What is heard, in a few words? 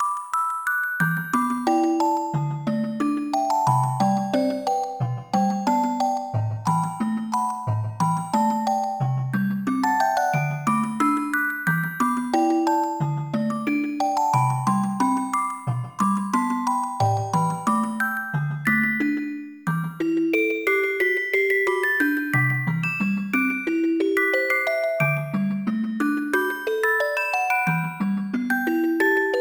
toy clock; One Way Heroics Plus; WindSphere